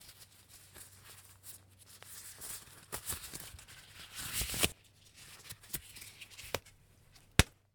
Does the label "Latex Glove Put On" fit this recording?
Yes